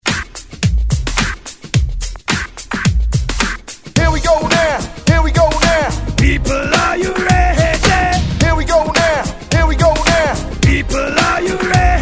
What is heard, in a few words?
Throwback Hype 108Bpm Clean; DJDOLLS